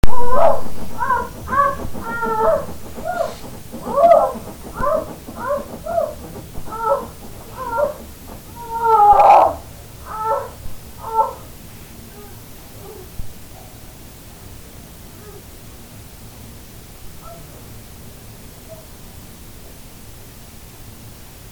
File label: Bergen Norway My Fucking Neighbors, field recording; Gijs Gieskes